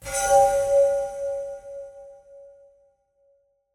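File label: Glass Tonal Dark Tone Thrill; Mechanical Wave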